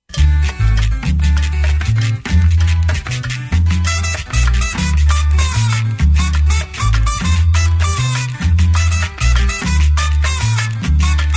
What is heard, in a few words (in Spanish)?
Guaracha